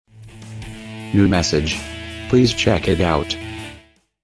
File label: new message ringtone